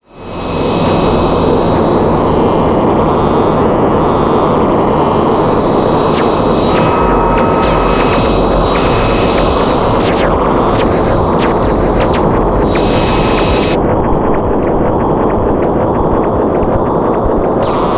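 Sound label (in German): Erica Synths Perkons HD 01 Drone Beatübergang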